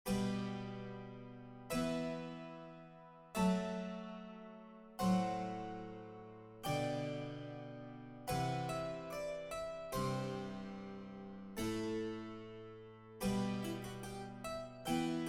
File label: The Sun Dappled Forest (for solo harpsichord) Chaconne and Fantasia